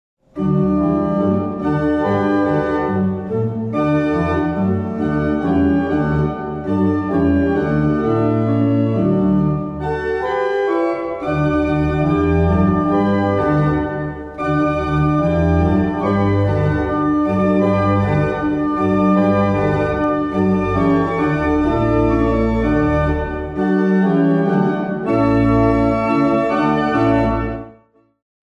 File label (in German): Orgel Halleluja; heimathaus viechtau; Musik-CD 40 Jahre Heimathaus